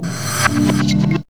REV DRM

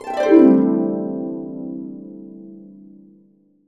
C, Harps; USB Soundscan vol.62; Complete Harps; AKAI

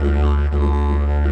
DIGERIDOO; Best Service; World Colours

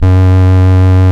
VOX F#1, Vintage Keyboards; Big Fish Audio; First Call Vintage Keyboards

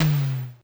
E. Tom, Yamaha EMR-1

